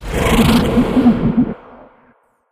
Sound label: advisor attack; ambient; outro; source engine; episodic